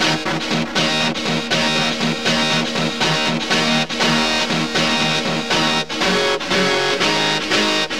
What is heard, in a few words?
GUITAR, Rythme